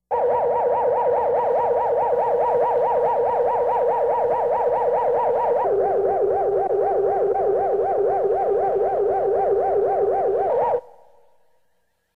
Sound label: spectro analysis viewer